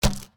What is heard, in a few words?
Mushroom Music Small